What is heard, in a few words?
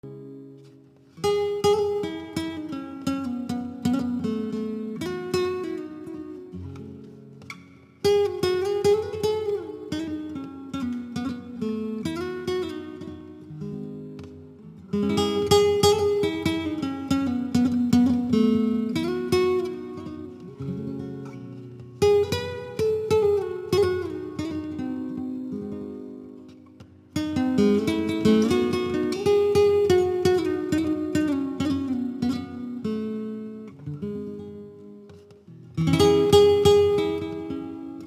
strings